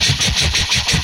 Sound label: Helicopter Loop, retro arcade; MMRetroArcadeSoundsPack; Vehicles